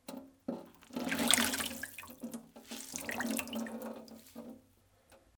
vyzhimanie

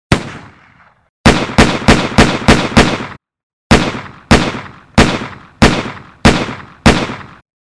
zvuk vystrela

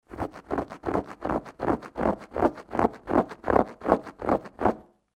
skrebushhie zvuki

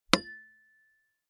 zvuki shinomontazha

